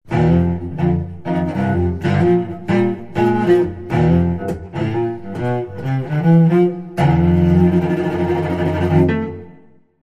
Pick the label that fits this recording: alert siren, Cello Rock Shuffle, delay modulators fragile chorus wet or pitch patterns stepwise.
Cello Rock Shuffle